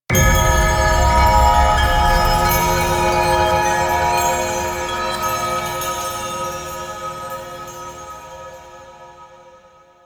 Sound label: Drone Buff Activate; Warframe; The New War; Narmer; Grineer